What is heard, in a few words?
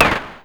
shoot; fps game